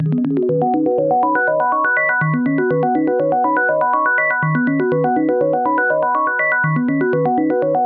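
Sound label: 122 BPM Arps B major G minor Tonic B; arp essentials; Aura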